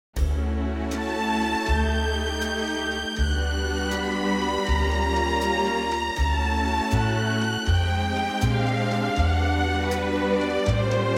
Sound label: D orchestral